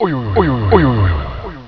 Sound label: Head Noise; BOOM